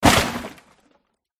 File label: tree attack contact middle